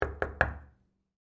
Door Knock Hard, Foley, Door, Knock, Hard, Wood, Light, Bedroom; Blast Wave